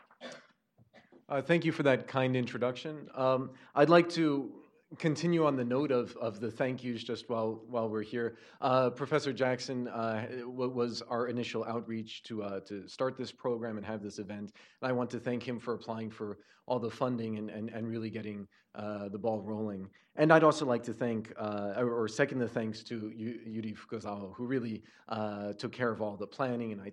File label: shamisen performance